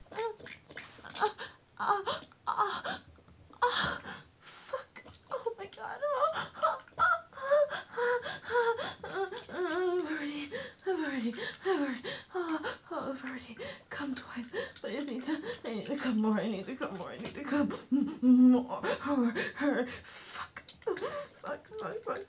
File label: girlie noises, wet sounds, hitachi; Just Another Incoherent Stoned Fap; multiple orgasms